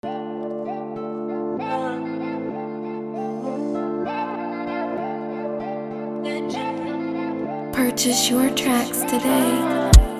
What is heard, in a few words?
Free beat 97 bpm F major